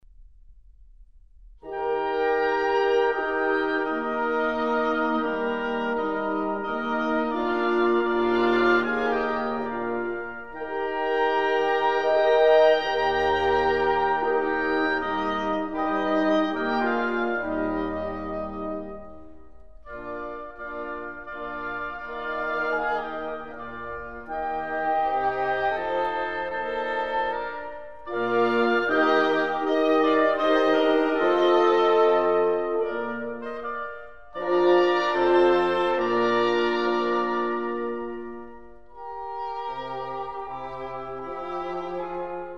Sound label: Divertimento in E flat Adagio, Wind; Mozart; Serenades and Divertimenti for Wind